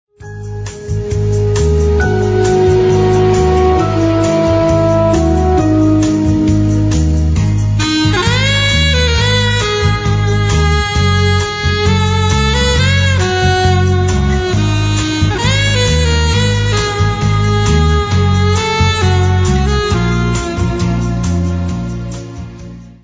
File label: Milk Tray Sax Jingle; Cadburys